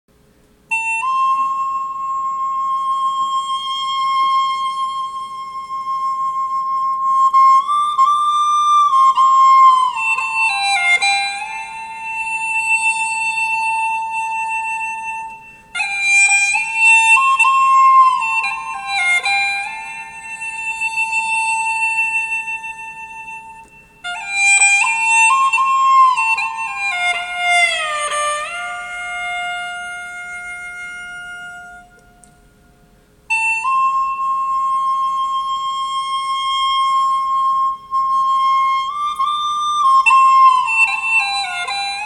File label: hulusi; shifanyuequ